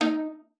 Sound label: D#4, VIOLA FINGER; Xsample VOL-5